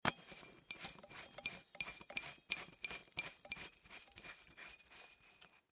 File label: Household Light Bulb Screwed In; Lamps (Sound Effects:Household)